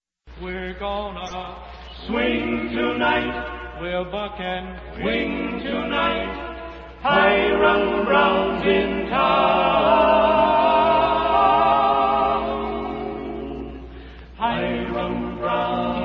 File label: rural rhythm, Barbershop Quartet